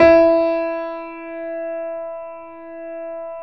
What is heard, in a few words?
E 3, Keyboards Acoustic, GRANDP ST; USB Soundscan vol.17